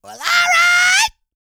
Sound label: YELL, MALE HUMAN; Spectrasonics Vocal Planet